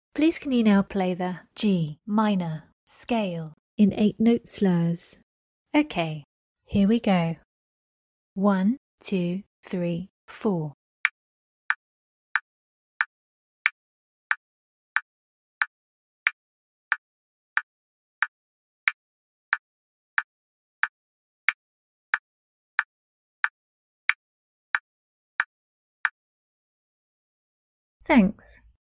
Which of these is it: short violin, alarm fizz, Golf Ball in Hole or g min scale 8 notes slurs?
g min scale 8 notes slurs